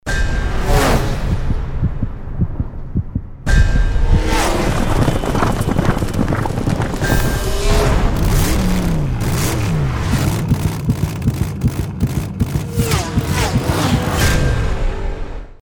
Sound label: RINGTONE; FASTKART MUSCLE